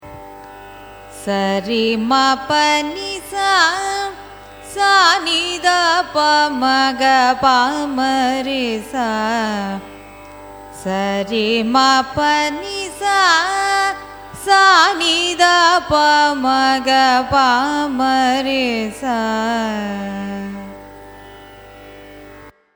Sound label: raga surutti arohanam avarohanam; carnatic music